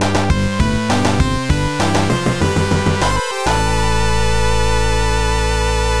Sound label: Stage Clear